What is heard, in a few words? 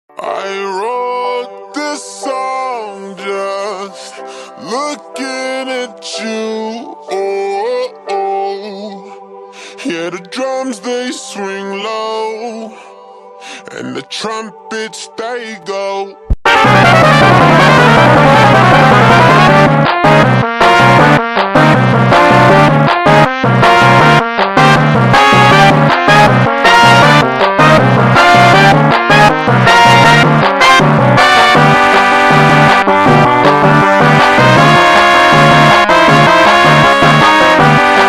meme trumpet; reversevape